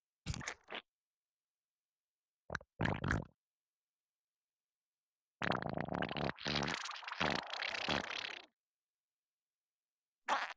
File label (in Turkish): Pırt Sesi İshal ve ıslak osuruk